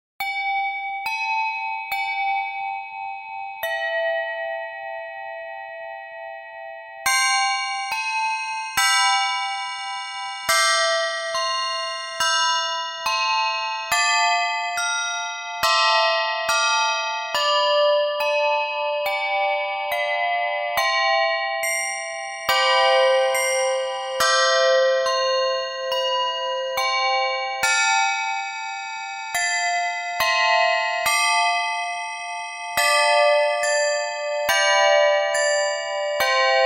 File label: Silent Night, 2-3 octaves